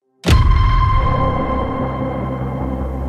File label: Time change transition